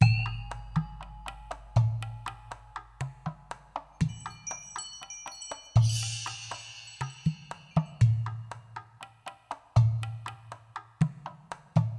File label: Asian Perc